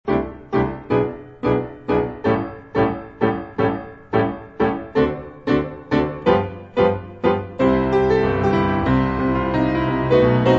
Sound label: ragtime medley